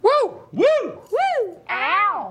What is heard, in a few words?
Woo Woo